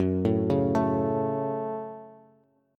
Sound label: F sharp Minor F sharp, Bass